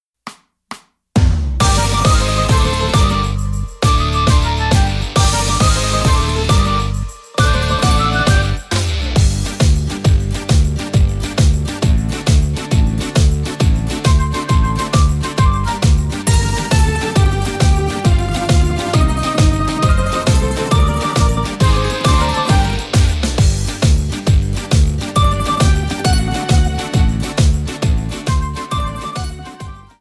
tarantella, BASE

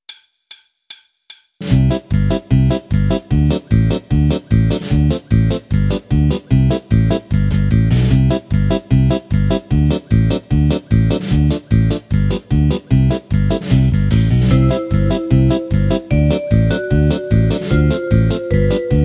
Polka Swing BASE

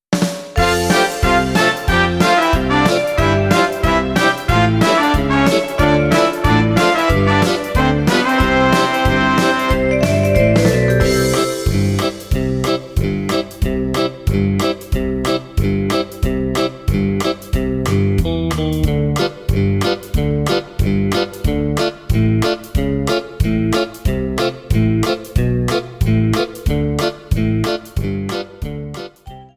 ITALIANISSIMO Fox Trot BASE

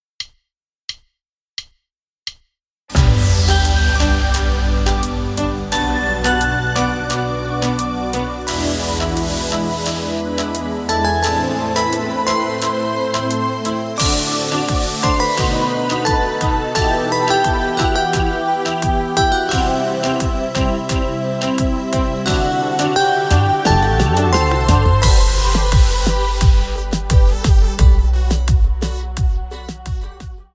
KIZOMBA base

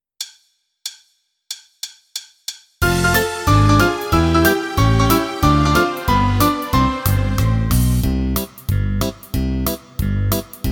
fox trot, base

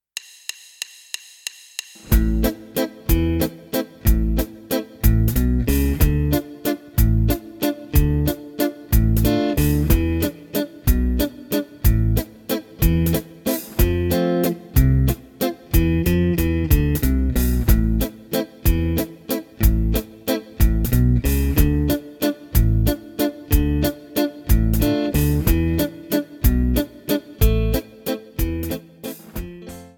base, valzer

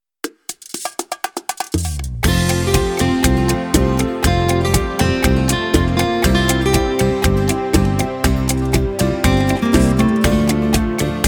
bachata, base

